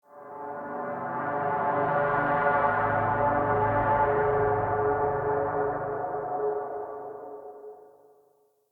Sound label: Crypt Cymbal Swell; Ambience; Champion Crypt